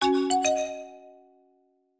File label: Leave Sound Effect